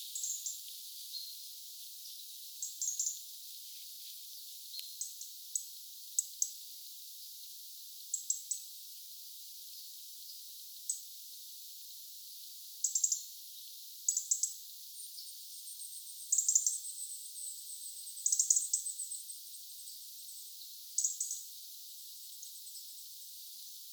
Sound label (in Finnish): punarinnan tiksutusta